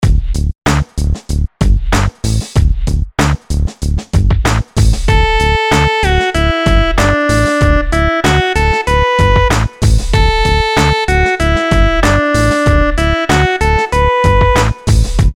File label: melodies simple syncopated, Dmaj; melody